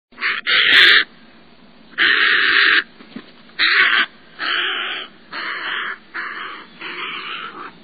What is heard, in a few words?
Little Blue Heron; Birds